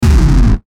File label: Hit Hard, Hit Hard Crunchy Bass; Blastwave